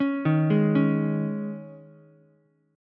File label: Ab Suspended 4th, Ukulele; Absus4 arpeggiated; 6,3,4,4; JGuitar